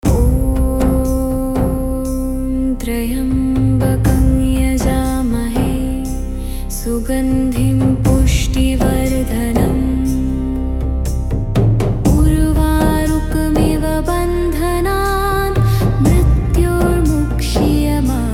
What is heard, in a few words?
mahamrityunjaya mantra female soothing voice; mahamrityunjaya mantra short male soothing voice